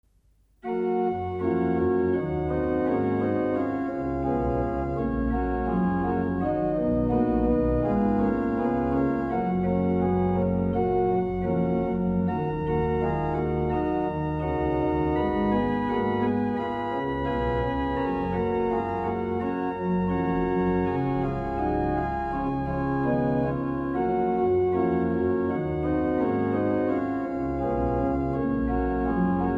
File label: Das Orgelbuchlein Glaubenslieder Alle Menschen mussen ste, Bach Organ; Das Orgelbuchlein, BWV599-643